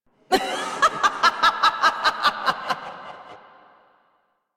Laugh; Warframe; Kill Her Killer; Rusalka

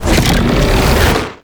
Rupture Cast; Roathe; Warframe; Tau; Abilities

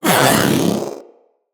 Companion Vocal Attack Melee, Pets; Zanuka

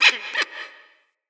Forest Open Glade Bird Far, Day

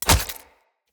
Throwing Knives Impact Flesh; Weapon Impacts; Combat

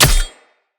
Long Sword Impact Flesh

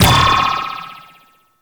Ball Bounce Goal Post; Warframe; Tenno Ball; Missions

